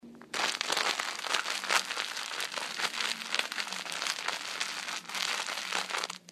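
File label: loofa bungee scrunchy crinkle sound, dog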